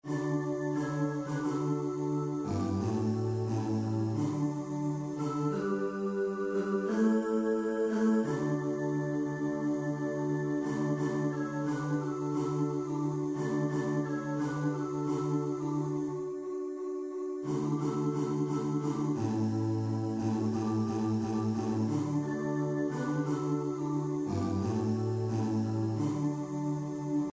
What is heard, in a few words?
Joy to the World BASS; HAENDEL